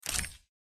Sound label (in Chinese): 点击人物; xitongyinxiao